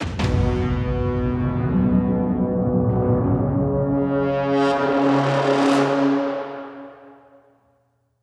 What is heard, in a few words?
Death BRASS; Down to Hell